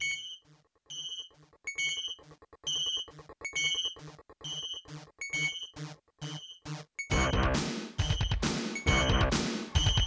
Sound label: acer magic s23a; soundcard test